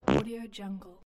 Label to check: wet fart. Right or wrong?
Right